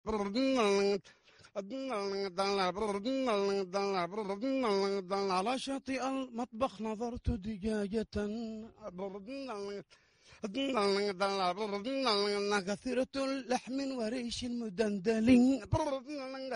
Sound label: sms tone; pubg